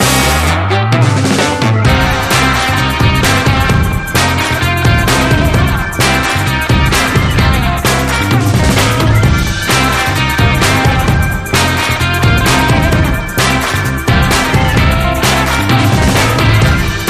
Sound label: Carnaby Street Twist Inst